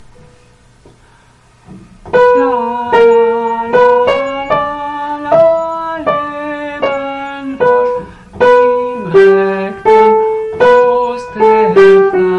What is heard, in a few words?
SOPRANE, chorale